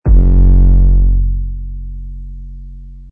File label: Bass Attack F